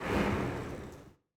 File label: ambience room dark temple pentagram ready